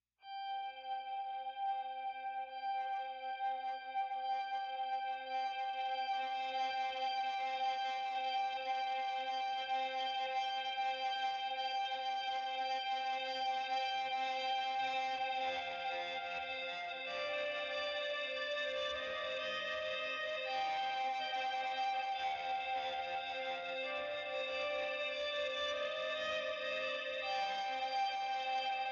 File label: gtrs